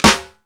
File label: Hip House, drum machine